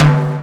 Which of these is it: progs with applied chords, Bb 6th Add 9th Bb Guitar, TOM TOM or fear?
TOM TOM